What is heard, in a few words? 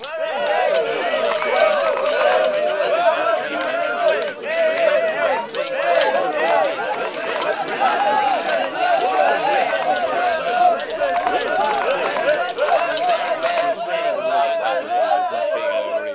reporters crowd and camera flashes